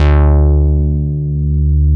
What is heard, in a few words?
MOOG1 BASS D2